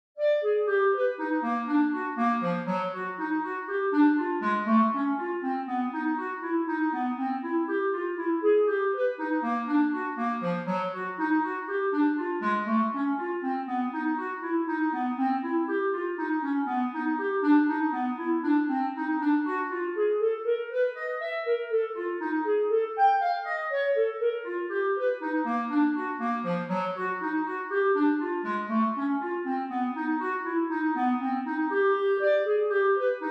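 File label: Scherzo Clarinet II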